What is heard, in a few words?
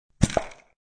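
Mixer Lid Open, Popping open a metal ice shaker.; Drink Mixing; Bars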